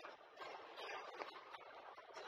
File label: flute, Loops Phonky